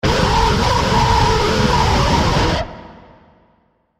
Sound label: jumpscare; pyro